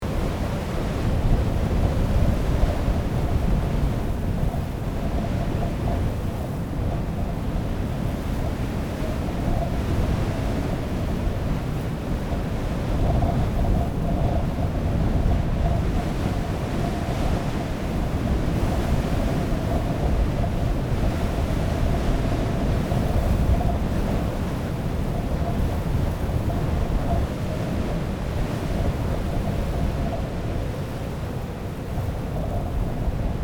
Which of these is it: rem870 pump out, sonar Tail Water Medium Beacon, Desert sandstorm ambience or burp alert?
Desert sandstorm ambience